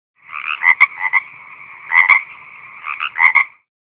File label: Bullfrog, Nokia 1110